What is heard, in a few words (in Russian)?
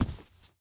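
частый глухой короткий выстрел салюта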